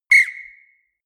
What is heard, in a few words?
whistle police light